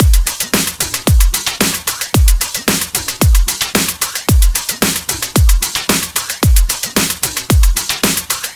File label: 112 Moombahtech, Discostep; Discostep Vol 2